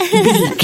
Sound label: voice female laugh